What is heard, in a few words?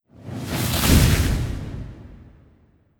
Fire Elem Magma; Legend of Keepers (gamerip) (2019)